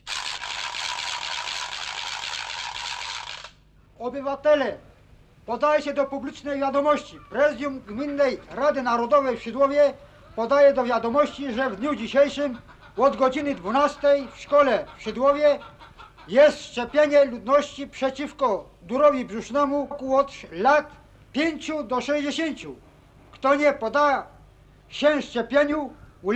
trajkotanie; Szydlow

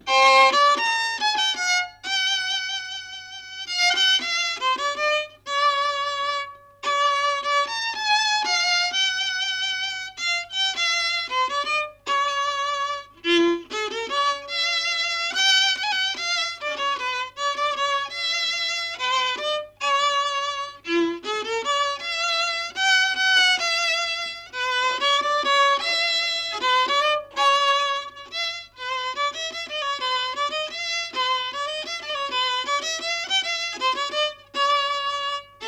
melodie weselne

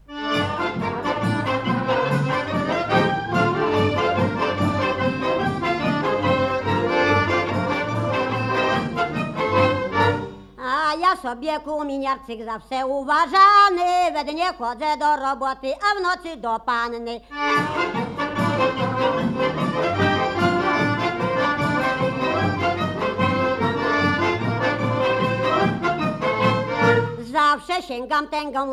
Krakowiak

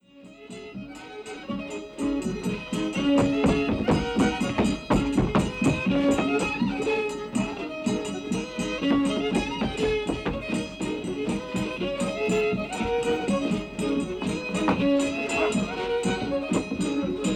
granie

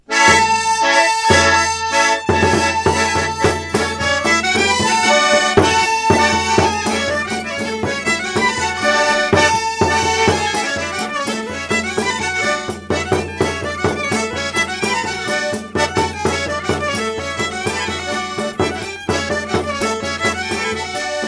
Ober ciągły

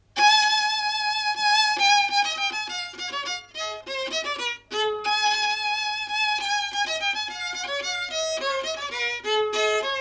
oberek

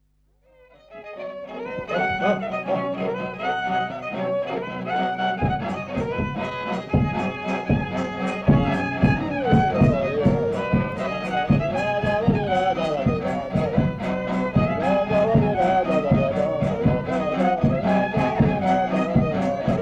oberek; kranów

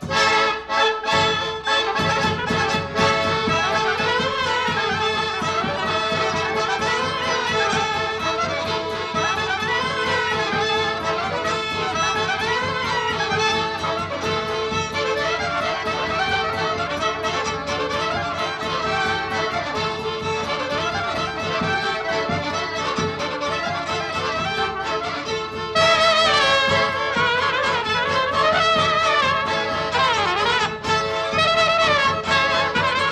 kapela oberek włoszczowicki

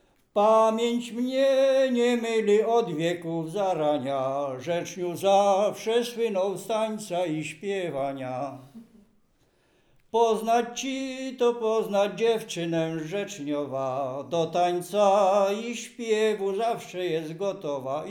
przyśpiewki

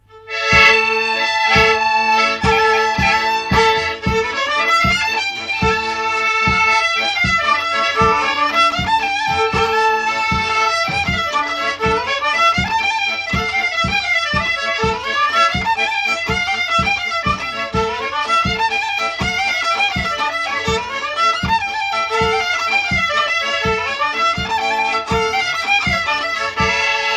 Oberek; Od Przystałowic

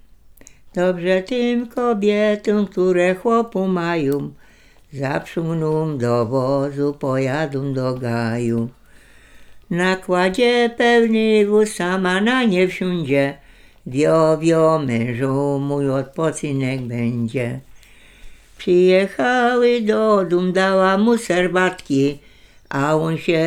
przyśpiewki oczepiny

